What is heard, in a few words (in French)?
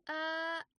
Grenouille Heu; mirumiru